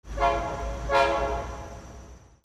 TRAINS

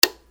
CLICKS

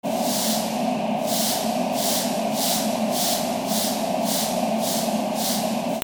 INDUSTRY

